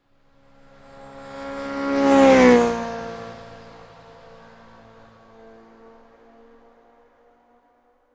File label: motorcycle pass by; transportation